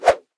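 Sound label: free spins throw; wild worlds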